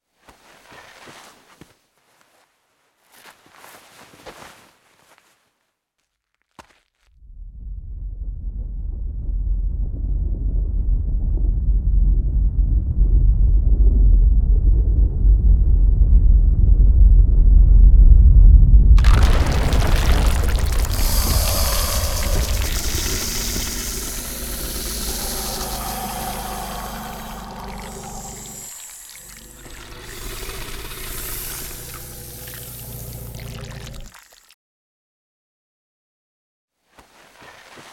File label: boss monster transf; cs boss derwish monster